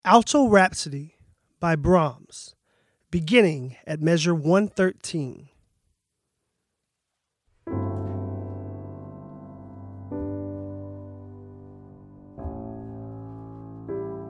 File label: Alto Rhapsody Solo Audition